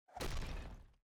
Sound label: dense rock thrown